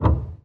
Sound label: piano